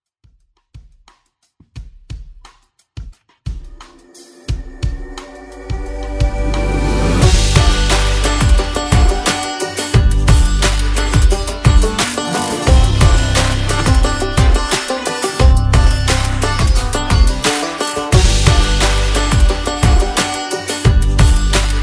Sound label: Country Redrum; remixplanet